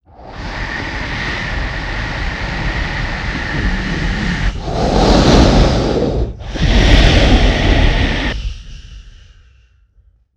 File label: Eerie Breath Composite, Horror; Sound Design